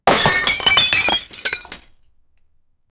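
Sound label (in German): Topf fällt um und geht, fall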